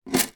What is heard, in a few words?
household plastic jewelry place down on table